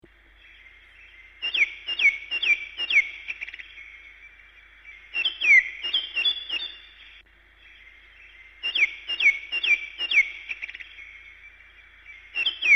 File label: bird